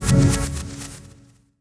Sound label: G b m Glitch Fx